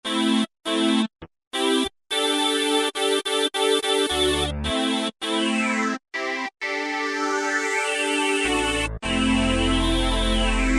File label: Ld Jupr Synth, E-mu Morpheus; Factory Presets